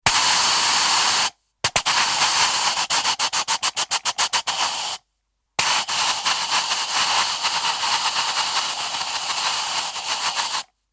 Air Escape Hiss Longer, Air Escape - Puncture type hiss - longer x3; Hisses; Objects; Tom Hutchings